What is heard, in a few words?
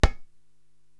Baya Mute; Korg TR-Rack